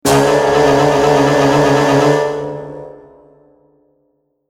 fuan

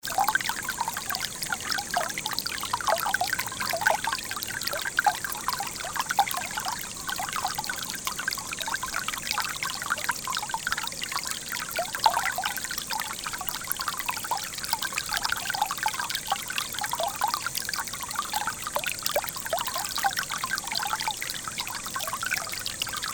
rice field

